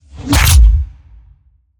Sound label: Impact; SoundBits; Whooshes & Impacts